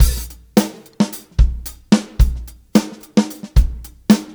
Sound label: Live Beats, R&B Drums